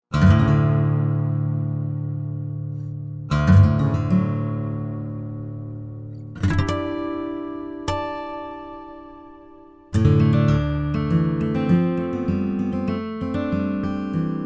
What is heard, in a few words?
Gtrs; Zimmer